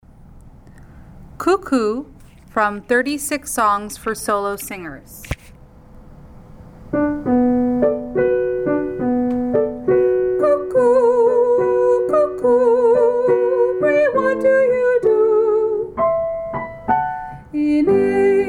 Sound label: Cuckoo, Solos for Young Singers